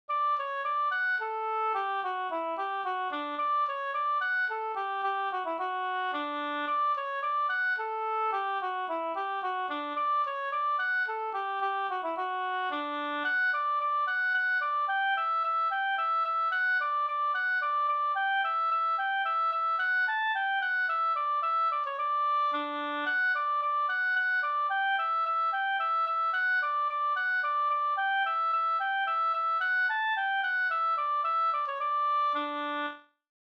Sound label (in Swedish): slangpolska